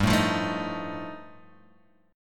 G 7th Suspended 2nd Sharp 5th G chord, Guitar Open G